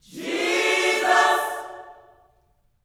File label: GOSPEL CHORD, Vocal Planet; JESUS CHD